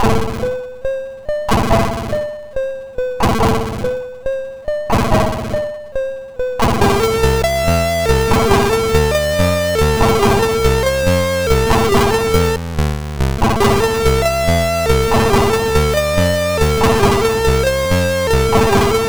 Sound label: sid 6581